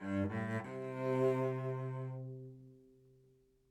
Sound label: live string phrases, string phrase Cmaj 100bpm